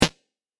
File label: click attack; steamcommunity; towerattack